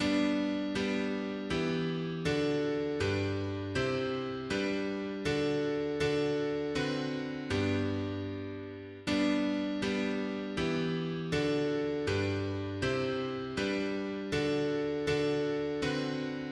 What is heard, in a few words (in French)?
Nos bouches proclament mono piano